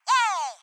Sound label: emote cheer